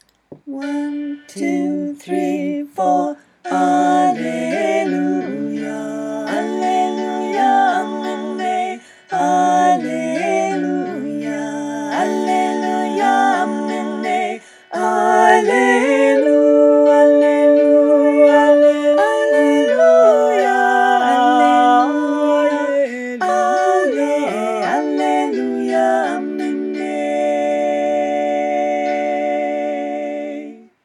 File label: Torres Strait Alleluia 3 part